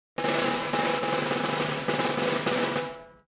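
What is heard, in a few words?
Drums Snare Circus Roll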